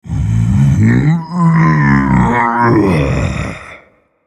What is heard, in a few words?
Vocal Colossus Pushing Blocks